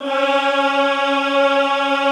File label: EHH C#3, Classical Choir; Best Service